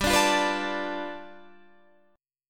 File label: G 9th, Guitar Half Step Down